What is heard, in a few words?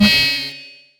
Tuned Mid Key UI SFX